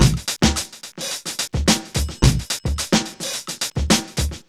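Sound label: 108 bpm, Funky loops; Zero-G; Funky Elements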